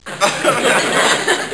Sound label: Reaction Chuckles, Large Crowd; Human; Soundsation